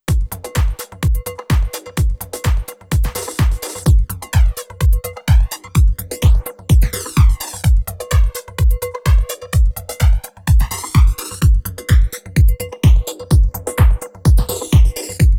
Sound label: Flanger